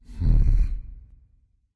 thinking; smite; khepri

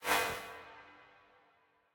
footstep trail; smite; khepri

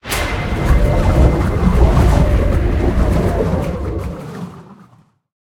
arcane loop; smite; god merlin

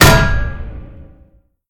knockback; smite; lancelot

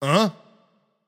surprised; chaac

